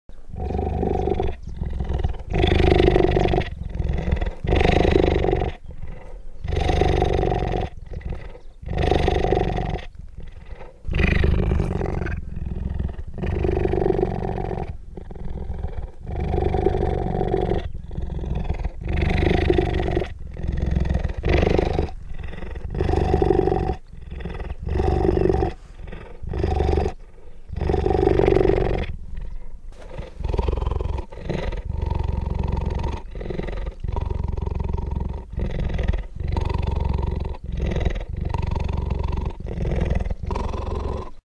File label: purr, cheetah